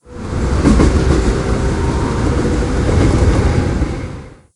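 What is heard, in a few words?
ambience Subway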